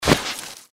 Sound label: gecko swing; npc